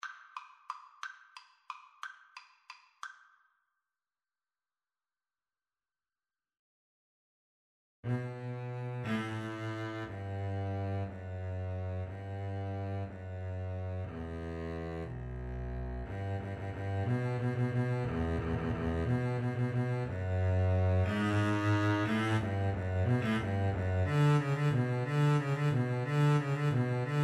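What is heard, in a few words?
violin double bass, Violin-Bass Duet; carol of the bells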